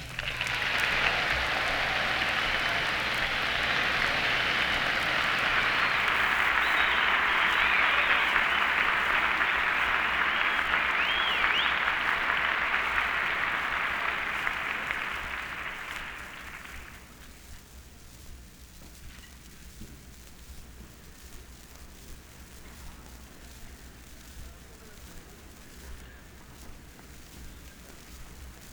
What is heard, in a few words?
Applause; Setting-up